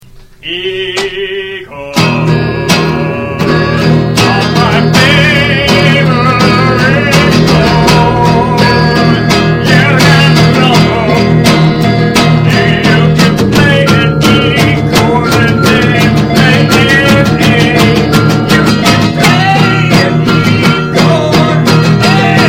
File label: 30 second song about e chords; shortsongs